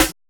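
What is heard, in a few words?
OFFBEAT snare; POP-ROCK2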